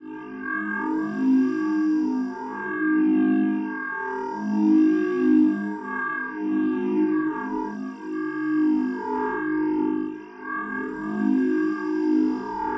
ball idle loop tonal

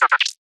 Computer Vocal Drive; B00M ONE

